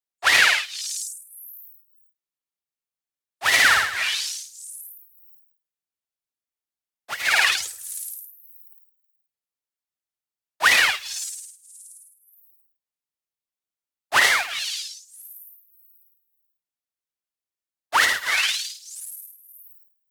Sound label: movement fast, bandpass noise; Lucky Bandpass Noise; boom library; Anime Essentials; DSGNMisc